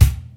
raw hip hop kick drum c key; tuned drums

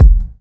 warm kick drum single hit a sharp key

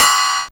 crash cymbal sound c sharp key

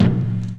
mid heavy kick one shot, B key; kick drum samples

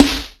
Tight Urban Steel Snare Drum Sample F# Key

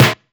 natural snare sound c key

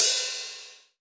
long open hi hat sample C key

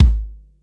verby kick single shot g sharp key

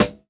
raw snare one shot G key; snare drum samples

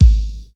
subtle reverb kick drum sound g sharp key

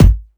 prominent kick drum g sharp key

